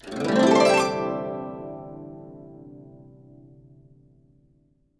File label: GUCH GLS, GUCHIN; INIS - Opium; GUCHIN-GLS